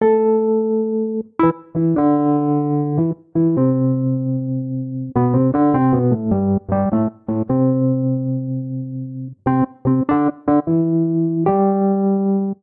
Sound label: Phrase Dub A Minor 76 bpm, Electric Keyboards; Ableton; Factory Packs